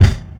punchy steel kick drum sample F sharp key

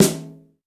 shiny snare D# key; snare drum samples

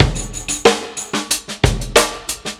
123 bpm breakbeat f key

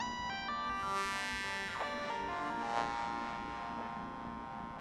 Harsh Harmony Synth Texture 100 bpm; instruments; loops

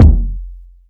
hot kickdrum sample f key; kick drum samples

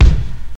2000s verby bass drum a key; kick drum samples

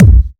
dry kick drum one shot a sharp key

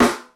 old school boomy acoustic snare sample f key; snare drum samples

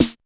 snare d sharp key

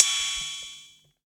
crash single shot c sharp key

